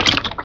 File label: Flesh Slap; Cruelty Squad